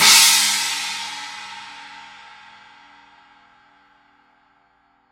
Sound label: 20 novo china edge, paiste 2002